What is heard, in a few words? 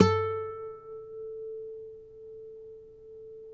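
nylon, FLAMENCO, Guitars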